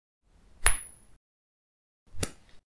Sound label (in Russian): monetu podbrosili i poimali